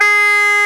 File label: BAGPIPES G#4, ETHNIC; AKAI S-Series CD-ROM Sound Library VOL-8